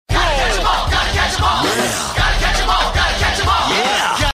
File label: rap forward; pokemon